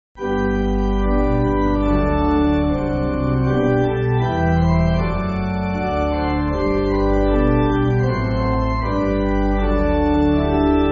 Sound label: Pipe, church music; Father Your Church; Collingwood 8.6.8.6